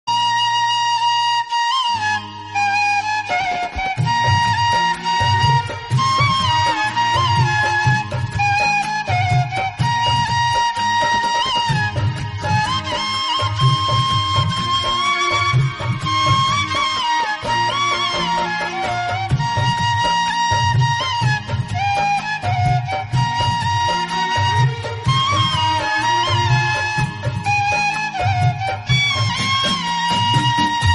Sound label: flute